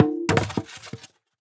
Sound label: Polystyrene Rattle